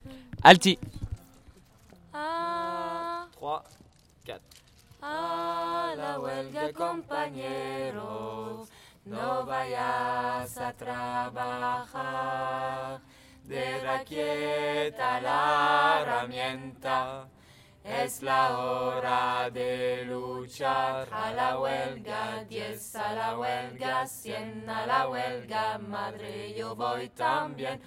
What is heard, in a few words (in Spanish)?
A la huelga alti